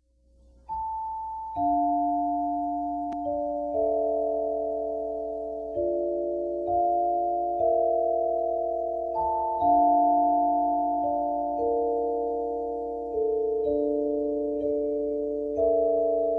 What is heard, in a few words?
D Minor wing